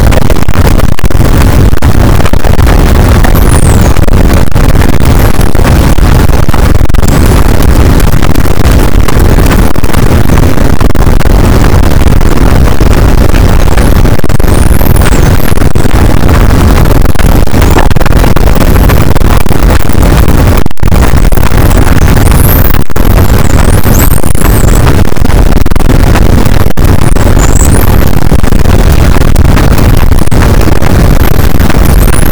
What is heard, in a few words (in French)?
cachalot positif, boat; Physeter Macrocephalus